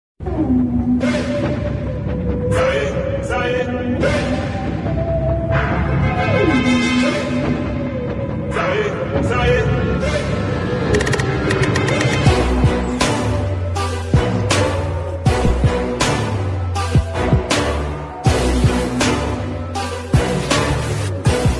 bgm attitude ringtone villan bgm; Siddharth Abimanu

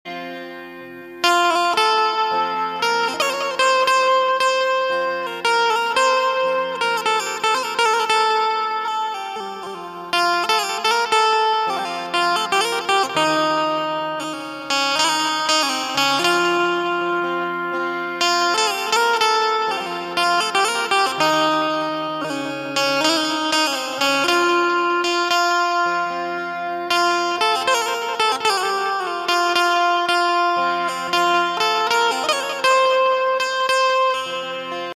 hindi song ringtone banjo ringtone; benjo music

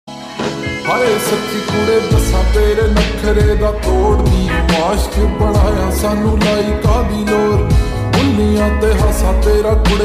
slowed reverb

